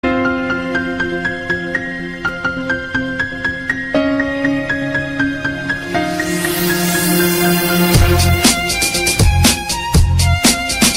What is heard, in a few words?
EDM Tiktok Remix